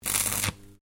Welding Arc Short, Arc welder; Welders; Machines; SoundEffects-Foundation4

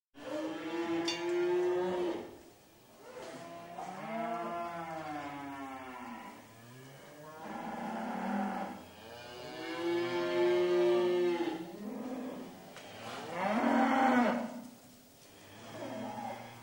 Restless cattle in shed; Part of Cows (Sound Effects:Animals); BBC

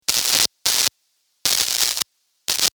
sparks, Electric Spark; Several Sparks , From Guitar Cable

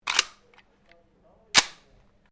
PISTOL TANFOGLIO 38 SUPER MAGAZINE LOAD

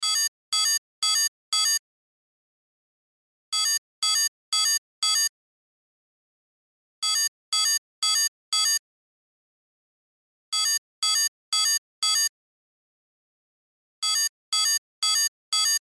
Cell Alarm Chime Loop; Alarms (Sound Effects:Electronics)

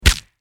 Impact Punch Face Hard Crunch; Punches and Hits; Combat

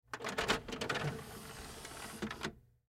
Cd Player Cd Tray Slide Open; CD Players; Electronics; Blastwave

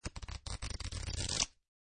Cards Playing Cards Flipping Through; Playing cards, poker. Flipping through cards.

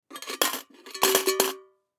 Tin Small Drop, Tin Drop In Coins; Money; Foley; Objects; Blastwave

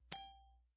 Ping; Pings; multimedia

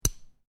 Lego Pull Apart Two Lego Pieces; Miscellaneous Toy Sound Effects

